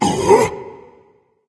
surprised; smite; thanatos

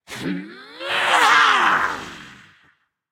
battle cry; smite; persephone

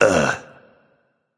ugh; smite; anhur

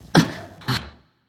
surprised; smite; voicepack; medusa

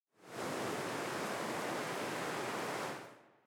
Victory Wind; Smite; Susano

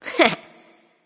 hehheh; smite; pele

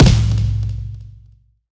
drum; smite; god raijin

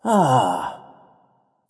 ah understand; smite; voicepack; hou yi

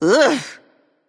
"ugh"; smite; yemoja

